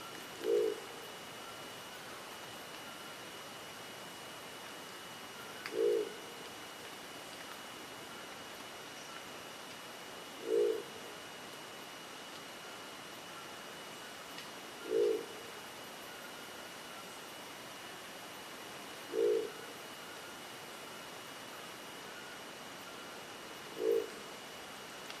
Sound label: Crested owl; جغد کاکلی